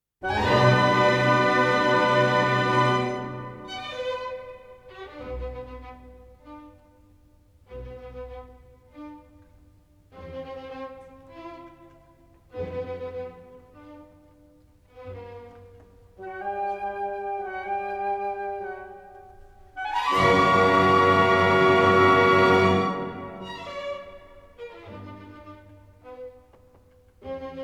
Violin Concerto in D, Adagio; Paganini

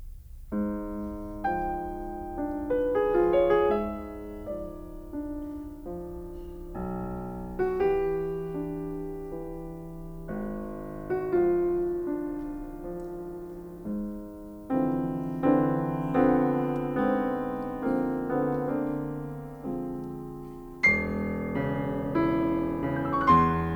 for piano, Andante; Visions fugitives (20)

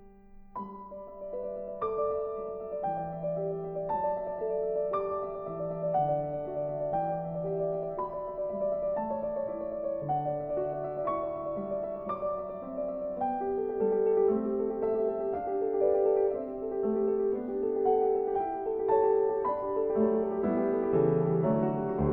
Variations, for keyboard

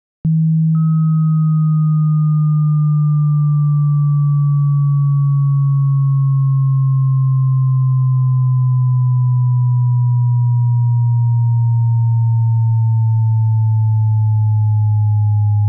Low-frequency sine wave sweep with harmonic; Subwoofer Evaluation and Setup; Surround Spectacular

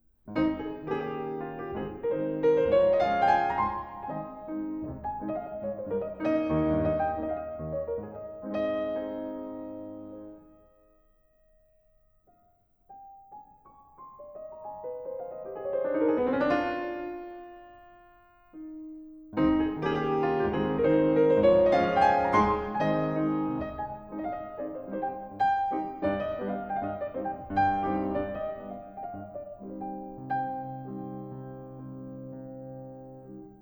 Piano Trio in A minor, Andante con moto

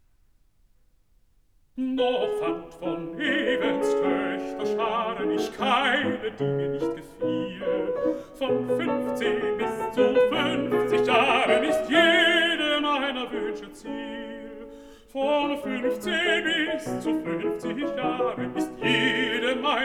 song for voice & piano

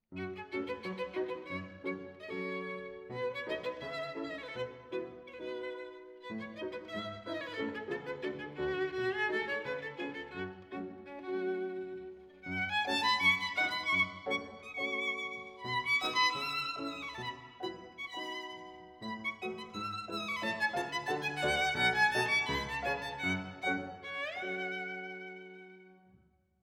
Romanian Folk Dances for violin & piano

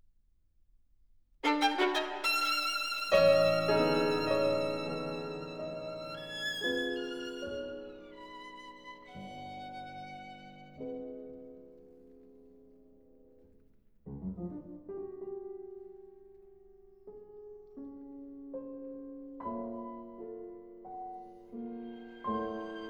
Pieces for violin & piano, Bewegt

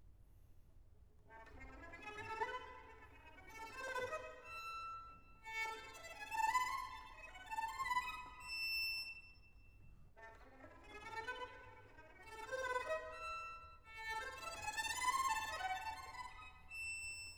Caprice Poco, Virtuoso Violin

